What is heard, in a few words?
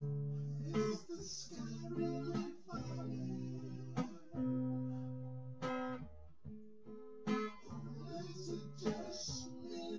nodrums synthconv 250ms 1m src; SiSEC08; SiSEC underdetermined